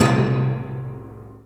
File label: Perc Hit; Roland M-OC1